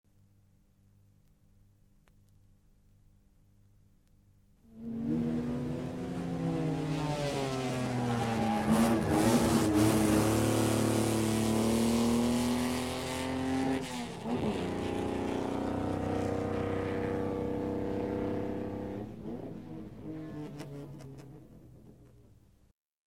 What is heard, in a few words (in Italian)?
PASSAGGIO AUTO DA CORSA